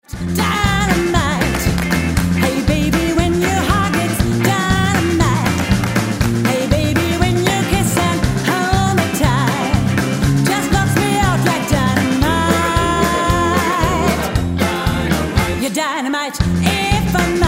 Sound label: Dynamite Drums